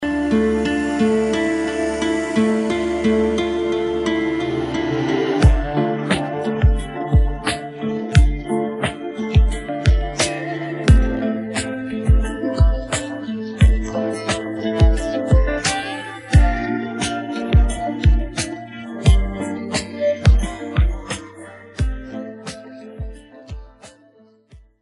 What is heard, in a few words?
beat, pop